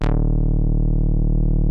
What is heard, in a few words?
TAURUS E2, synthesizer